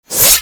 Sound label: Light Glaive Fold B; Warframe